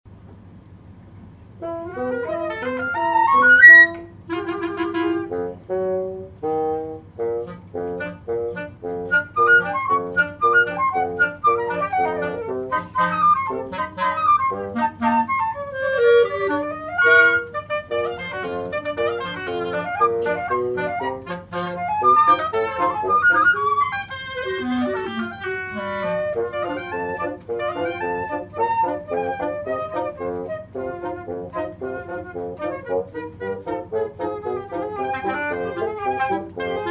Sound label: Polka; Shostakovich